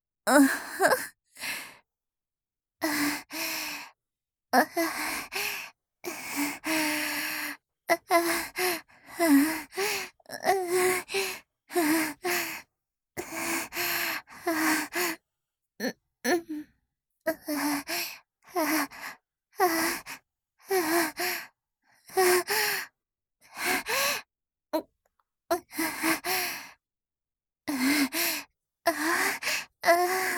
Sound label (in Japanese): 喘ぎ抜粋集